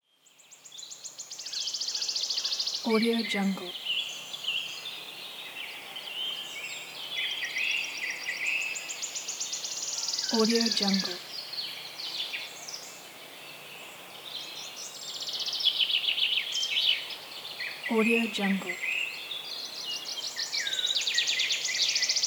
spring ambience

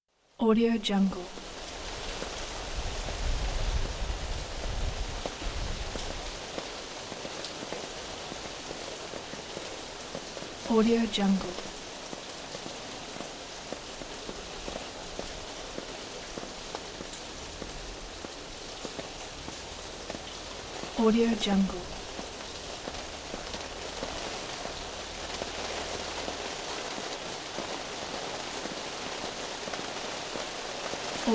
light rain

